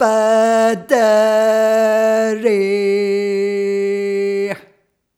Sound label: Rhythmic G